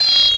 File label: MET SW SIN, SWORDSING; Total Control - Action Cutz